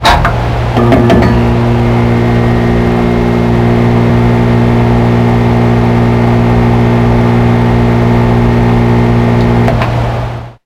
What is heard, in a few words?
light hum, Construction